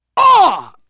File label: hurt critical